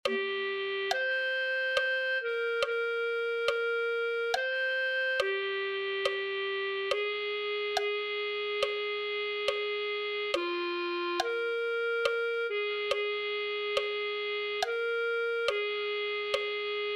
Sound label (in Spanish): clarinete